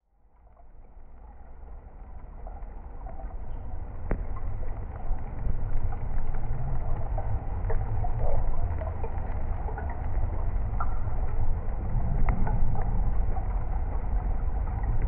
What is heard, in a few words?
underwater stream; danube orchestra